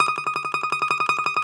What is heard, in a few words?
DOMRA, Russian Strings